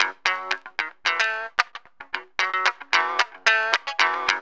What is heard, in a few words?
GTR LOP; E-Lab; X Static Goldmine 2; akai